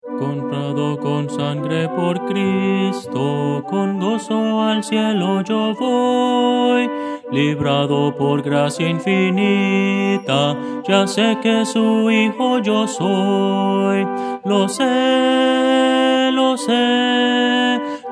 melodia; Voces para coro

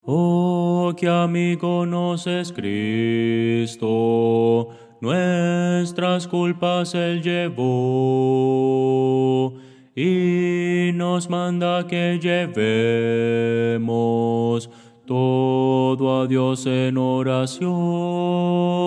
bajo, himnos cristianos; oh que amigo nos es cristo

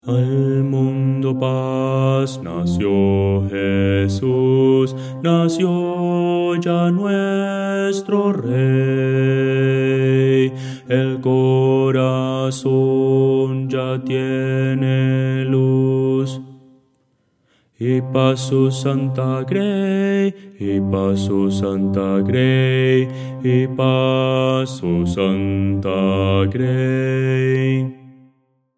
bajo

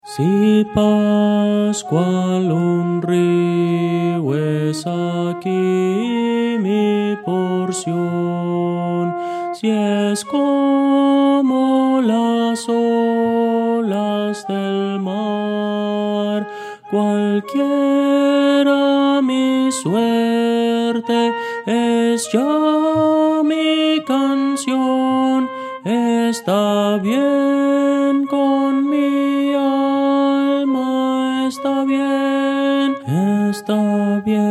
melodia

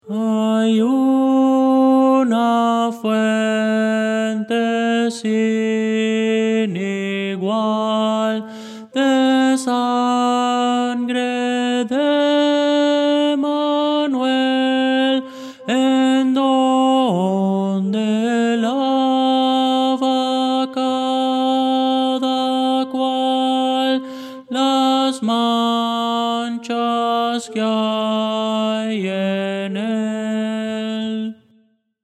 tenor; hay una fuente sin igual; Voces para coro

